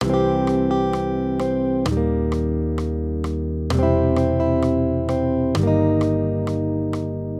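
Guitar, Accompaniment, Major Triads, C-Eb, 130 BPM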